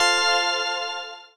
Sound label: autoplay on; Fire Queen